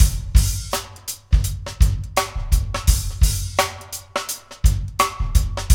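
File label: 84 bpm, Funky Drums; AMG; Now CD-ROM (Roland); Neil Conti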